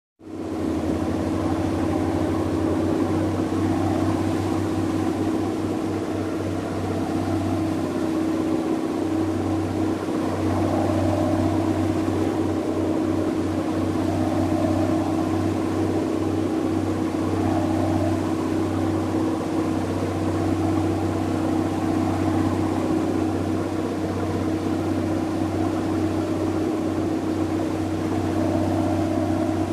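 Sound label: Coast Guard Cutter Ship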